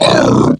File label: sleep hit